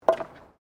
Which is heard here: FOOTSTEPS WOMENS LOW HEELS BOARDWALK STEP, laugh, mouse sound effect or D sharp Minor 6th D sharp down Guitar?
FOOTSTEPS WOMENS LOW HEELS BOARDWALK STEP